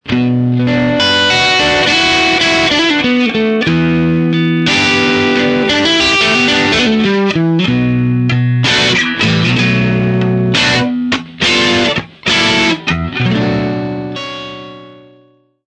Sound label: texas loco middle dirty; ainsley stones; axetec